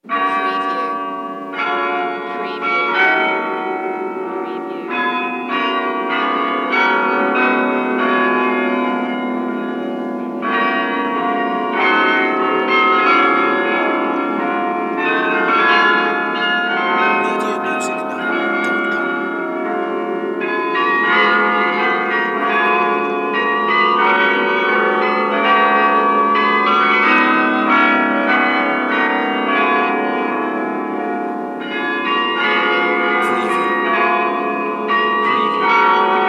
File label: Bells Carillon Oh Canada